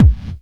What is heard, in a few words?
BD, Dance Mega Drums; Best Service